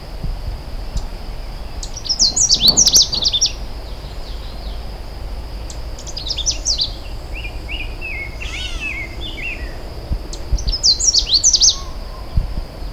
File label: Wilsonia canadensis, Michigan Bird Songs; Canada Warbler